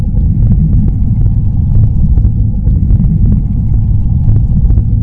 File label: timewarp; balloon rain